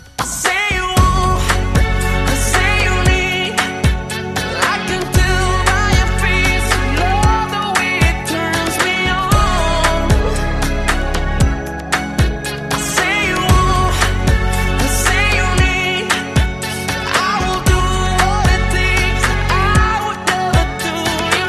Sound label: bells song